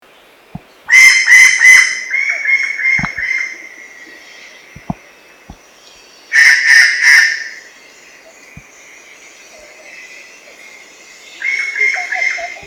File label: White bellied Treepie